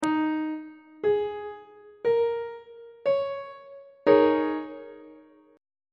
e flat dominant 7th suspended 4th chord on treble clef